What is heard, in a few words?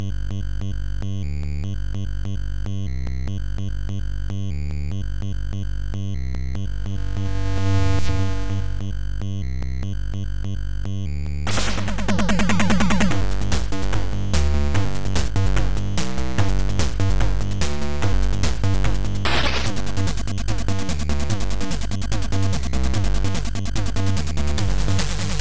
Drums; DJ Fast